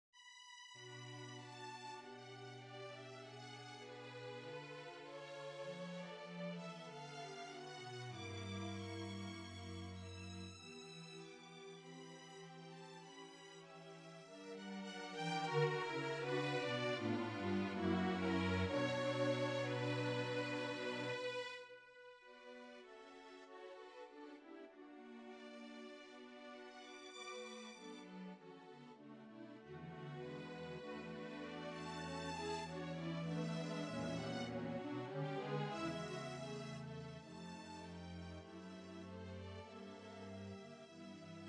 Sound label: adagio; andra caplet